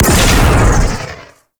Heavy Cannon Fire; Crew Ships; Vehicles; Weapons; Warframe; RailJack; Corpus Crew Ship

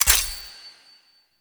Collectible Fragment UI Pip; Duviri

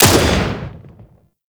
Grenade Launcher Shot; Grineer; Weapons

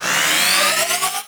Survivors Upload Power Up; Encounters

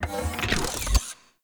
Turret Harness Pilot Unequip; Warframe; Vehicles; Rail Jack

